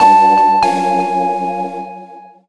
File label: Dreamy Alert; Sony Ericsson; W508; PlusFourSix; Brittany; Lars Edvall